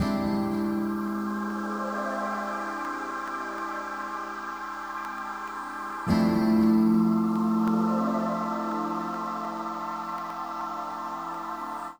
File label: PAD, Hip Hop and R&B; Best Service ProSamples vol.02; AKAI